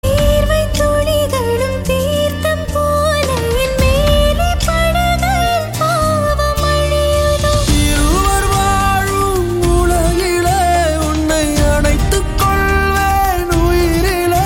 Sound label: Female Ringtone